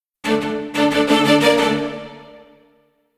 Combat Out; Novigrad

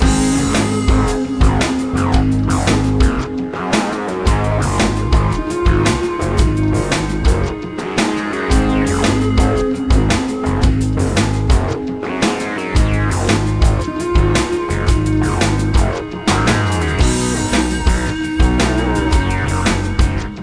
Loop

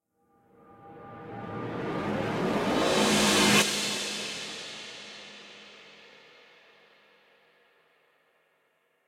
Transition Guitar theme; Terra Invicta

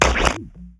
perc

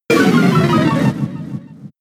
Stat Fall Down; pokemon sfx gen 6 attack moves xy oras

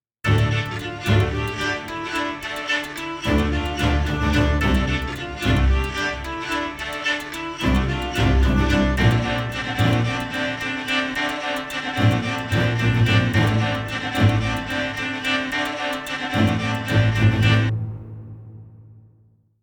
Monster Hunting Combat; The Witcher 3 Wild Hunt; Skellige

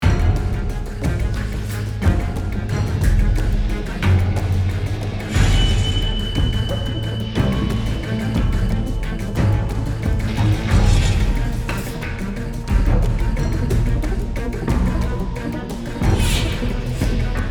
Action loop

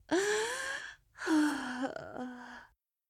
Awaken Babble Cries; Zara

